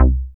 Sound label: BAS, Eurotech; Sounds Good